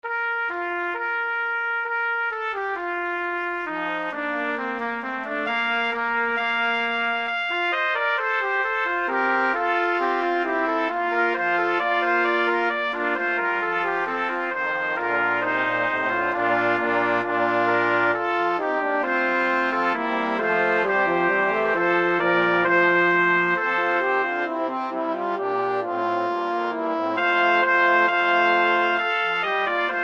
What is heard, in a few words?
Brass Quintet; Canzona Super Intrada